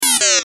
Video Game Beeps; Vintage Beep Chime; Games; Technology; Blastwave

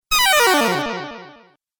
Falling Video Game

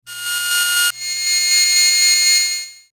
Logo High Frequency Flute; Sonic Logo Sound Effects; Production Element Imaging Element Accent Transition

